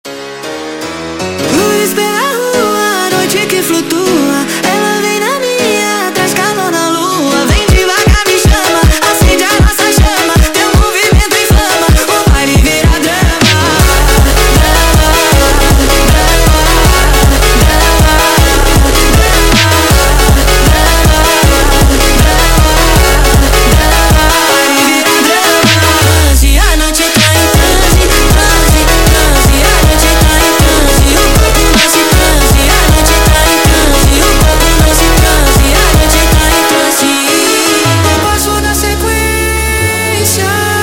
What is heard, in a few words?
Sped Up, funk